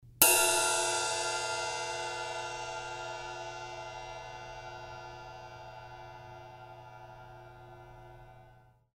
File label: S Medium Ride Crash, cymbal sound